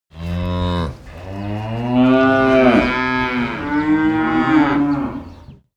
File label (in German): Kuehe